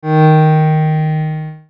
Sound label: Steinway